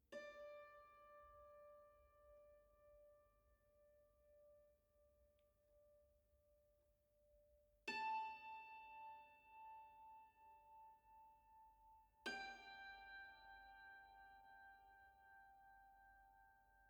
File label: Quartet